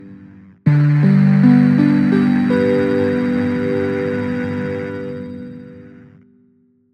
Trashcan Bell, jup8; Aphelion

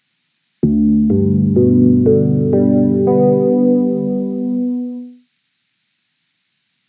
E Piano; j106

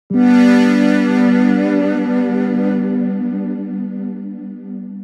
Blue Petals; jup8

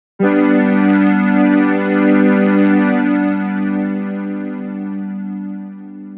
Techno Softhollow, jup8

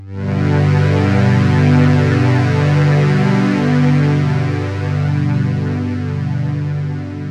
Double Junos, jup8; Aphelion

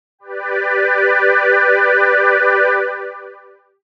Choir Voice, jup8

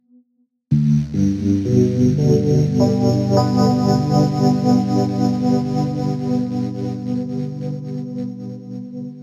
Wavebell, jup8; Aphelion

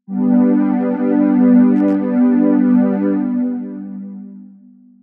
JP Soft Pad, jup8

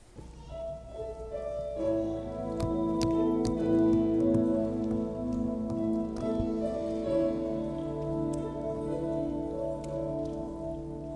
ambient pad swells; me80

